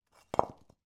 foley breeze block drop on hard ground

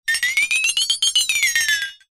cartoon wobble metal flexitone

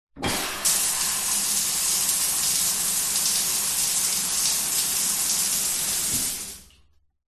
household shower run off

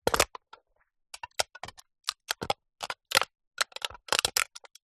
soda can empty cut open movements pressure; foley

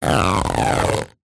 foley balloon long un inflated pull stretch

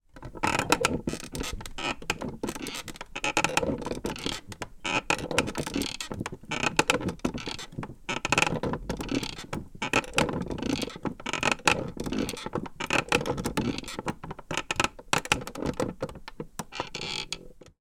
wood creaks circular movement; foley

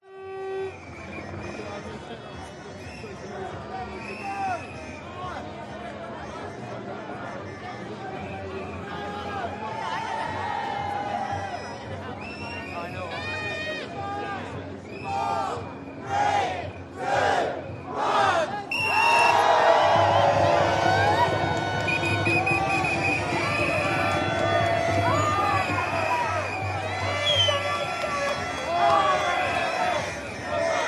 atmos protest beginning countdown; london music mixing

